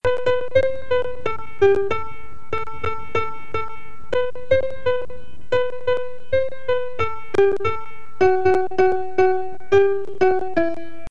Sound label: Funk